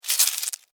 Insect Move Wet, Insect, Movement, Wet, Bug; Insect Movement Sound Effects; Insect Movement (Sound Effects:Animals:Insects); Blastwave

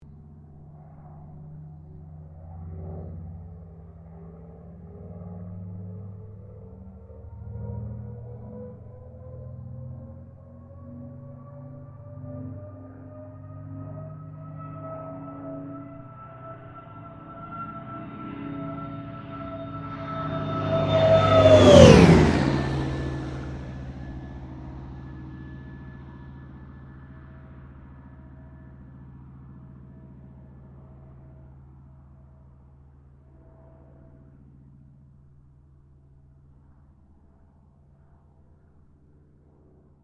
AIRCRAFT CA-13 BOOMERANG PASS STEREO

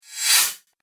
Warlock Magic, magic; Magic Sound Effects; Role Playing Game; Andrew Fleming

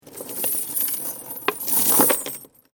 Chain Metal Rattling And Drop; Chain Sounds; Metal Sound Effects; LYN103 package of Chain Sounds

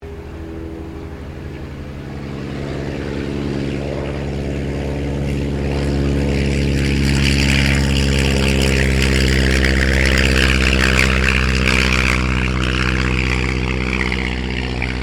AIRCRAFT RYAN STM-S2 TAKEOFF; 1939 Ryan STM; Airplanes; Vehicles

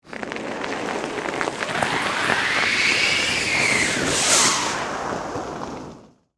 Snow Drag Tube, Inner, Across, Snow, Heavy; Weather; Snow Sound Effects; BlastWave

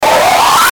Ascend Distortion Slice; Blastwave

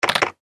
Button Switch Gear Multimedia; Web Interactive

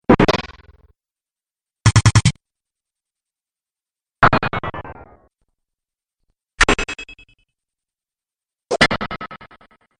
Percussive Hits, Sci Fi

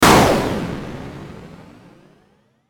Hit Static Ascend; Blastwave

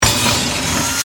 Hit Mechanical, Movement, Industrial; Bolts, Tighten; Production Element; Imaging Element; Accent; Transition; Hit Sound Effects; BlastWave

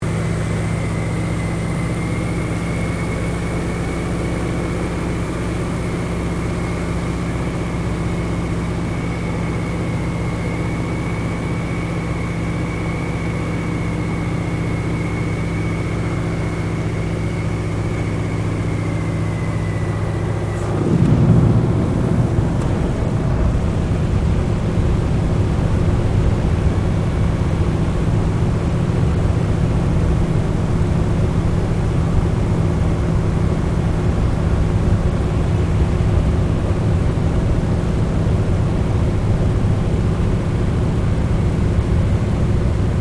AIRCRAFT AT-6D HARVARD MK III LANDING INTERIOR; Harvard Training Aricraft; Airplanes; Vehicles

